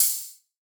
ride, cymbals, drum one shots

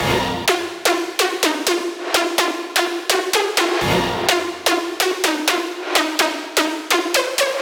Synth Loop Fm 126; Leads

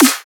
Snare

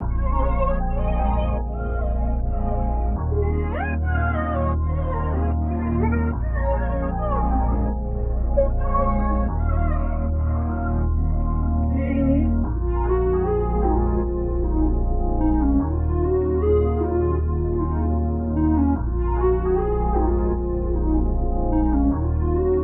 152 BPM A# Min, Melody Loops; Baby; Cymatics; Orchid Sample Pack

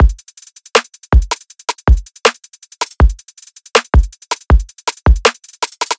Drum Loop Trap 160; Full Drum Loops; Dubstep; Ghosthack; Free Trap and Dubstep Samples 2018

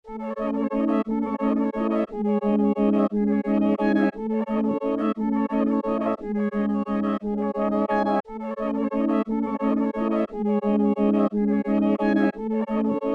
117 BPM C# Min Pumping Pad; Melody; Loop Stems; Cymatics; 2022 Melody Collection - Various; Estimate

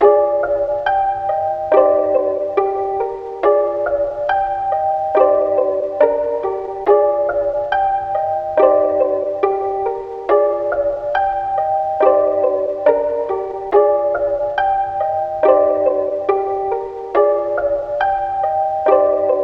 140 BPM C Min Plucks; Cymatics; Moore's Law; 2022 Melody Collection Platinum Expansion

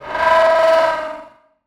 wooshes bent metal; Whooshes

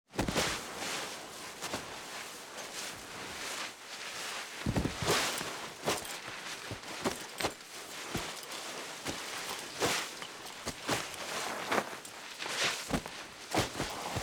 Body Drag in Dirt and Brush; Fairlight Sound Lib

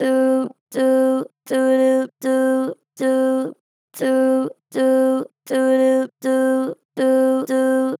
Str Flat Do Do, Vocals, Pitch Flat Strong

